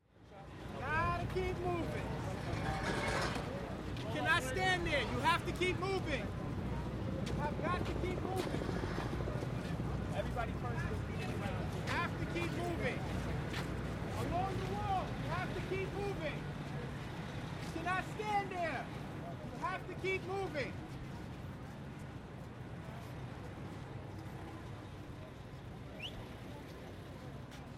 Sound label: soundscape